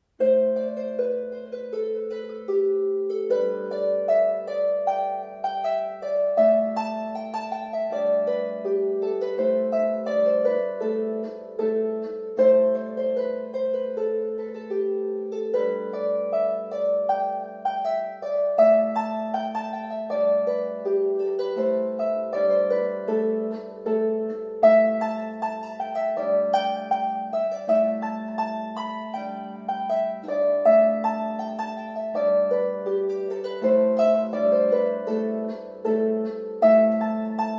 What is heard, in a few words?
Harp A minor Polka